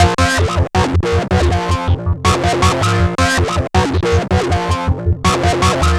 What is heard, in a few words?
Bass Loop